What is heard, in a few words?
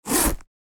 Purse zipper noise open close